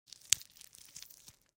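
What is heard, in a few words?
throat cutting sounds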